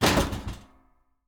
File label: construction impact